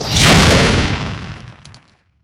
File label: fireball launch, fireball